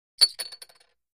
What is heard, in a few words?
Poker Chip Drop, casino games chip mvmt